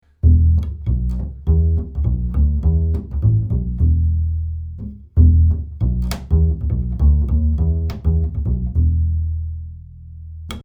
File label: Schoeps CMC6MK2S Upright Bass close 30cm